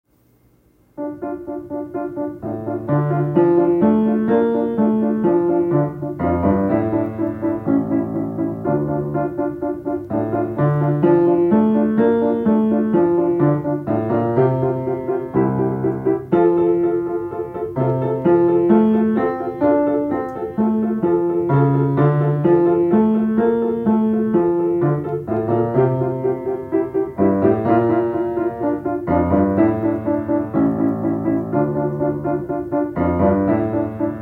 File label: Etude in D minor